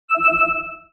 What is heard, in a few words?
ping; cave